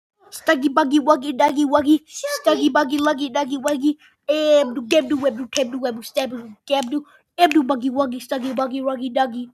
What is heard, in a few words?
random beat